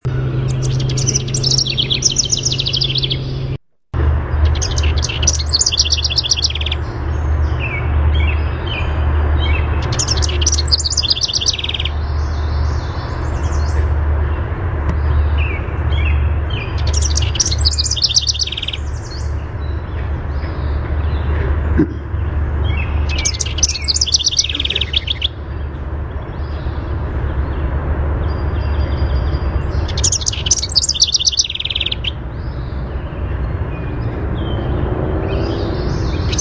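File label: House Wren song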